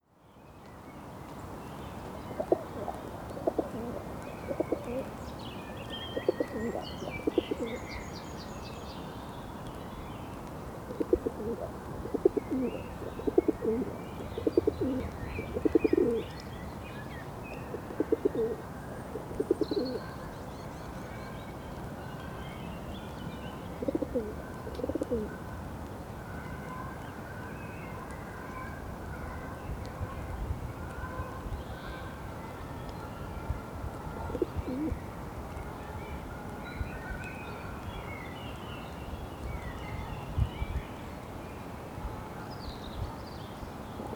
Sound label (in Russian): sizyy golub columba livia